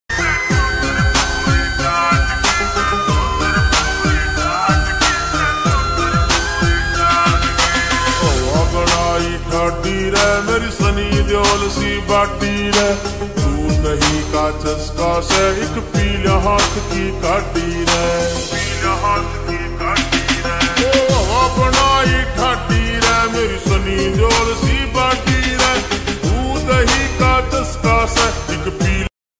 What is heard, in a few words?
song ringtone